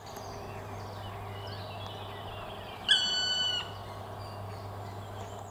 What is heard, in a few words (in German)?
Schwarzspecht